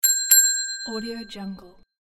bicycle bell

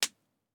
bone break snap

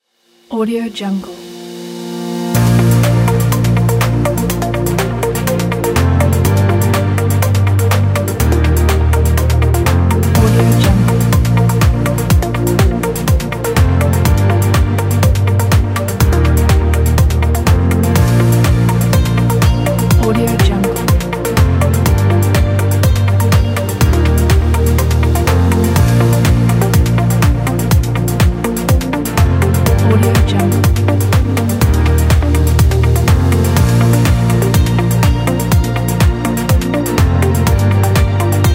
short video background

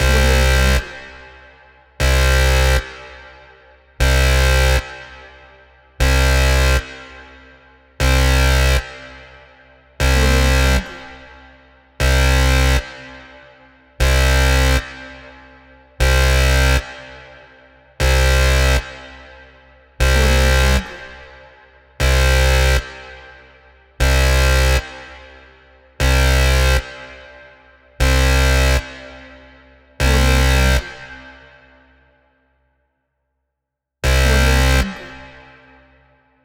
alarm siren

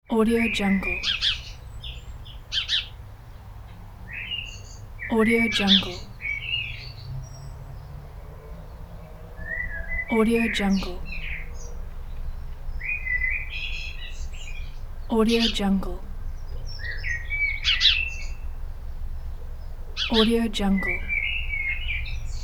birds and ambience from the UK

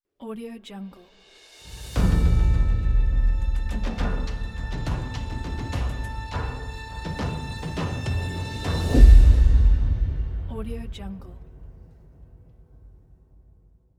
investigation crime logo

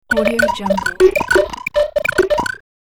alien insect droid inner workings; sonic-boom

